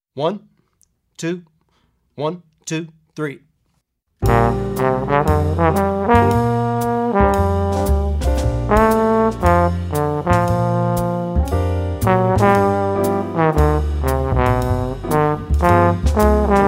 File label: Jazz Trombone, With Soloist; Effective Etudes For Jazz Trombone